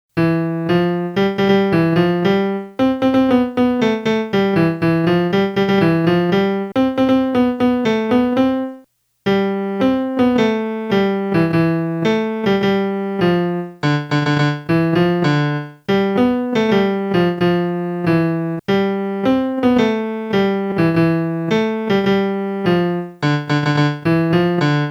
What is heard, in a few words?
melody